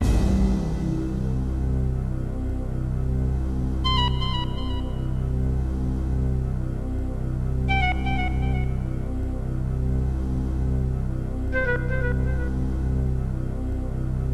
dungeon, music; commander genius

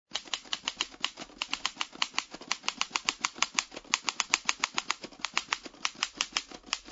typing; agent 8 ball

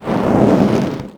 thunderhead; endless sky

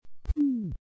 PLUMMET SND; commander genius

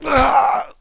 pain

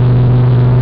engine; stunt rally

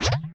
KEEN FIRE SND; commander genius

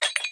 pot break; project hawk

